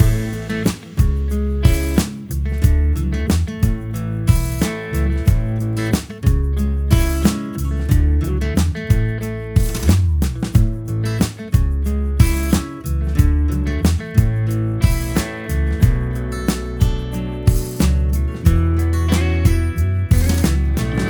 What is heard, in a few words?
Always Loop; Beatsuite